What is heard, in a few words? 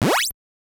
Opponent Spitting from Mouth; Mike Tyson's Punch-Out!!